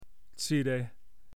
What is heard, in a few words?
Bird